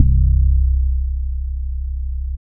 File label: SNGL.BASS, Jungle Rave